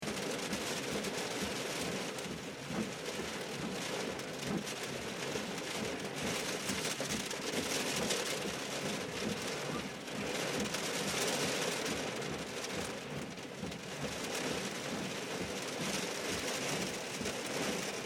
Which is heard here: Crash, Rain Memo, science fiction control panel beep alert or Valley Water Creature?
Rain Memo